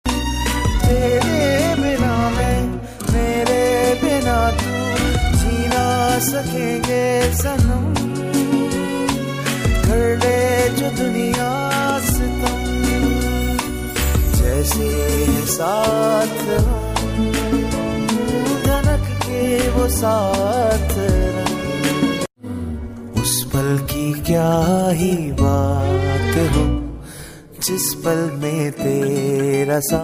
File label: pakistani ringtone; pyaar ke sadqay Ost